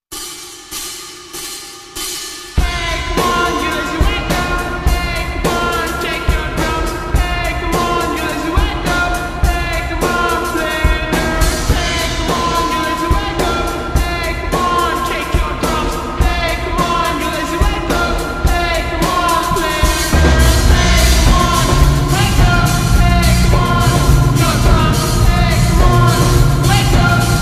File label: Slowed Reverb Remix